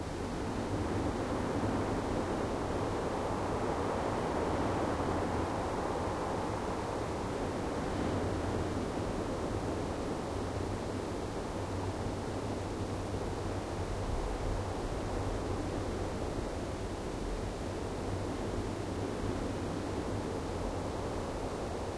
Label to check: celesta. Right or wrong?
Wrong